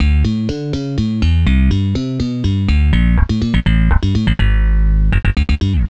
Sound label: 11050hz LPF on